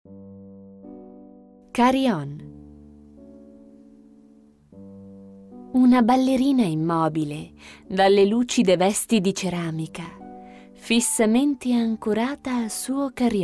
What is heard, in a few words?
Carillon